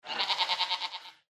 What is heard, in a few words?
sheep